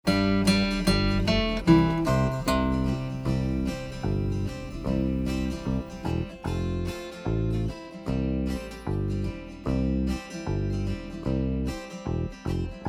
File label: Ho Ho Ho backing